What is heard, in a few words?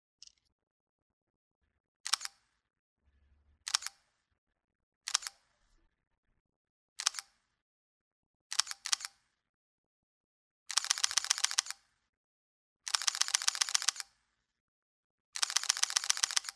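Olympus PEN, camera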